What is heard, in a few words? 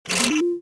switch; Star Wars Jedi Knight II Jedi Outcast; XBOX; switches